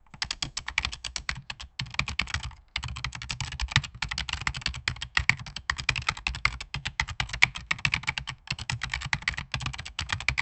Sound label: typing on mechanical keyboard